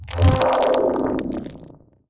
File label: ice